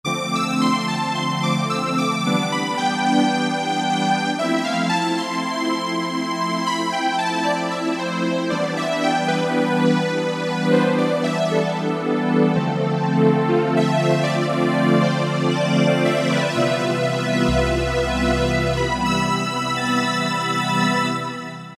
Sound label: SawSt, Yamaha TG500